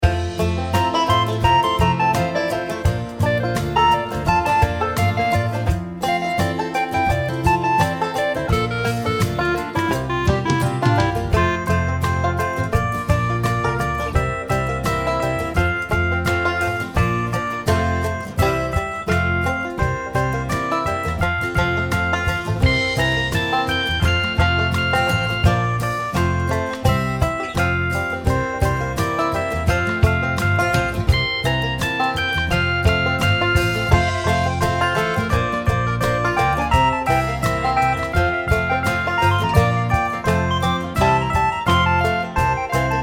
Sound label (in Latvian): Pastaiga in F, Trompete